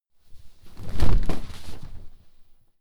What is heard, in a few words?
clothes low hit drop